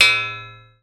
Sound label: impact metal strip large ground; zapsplat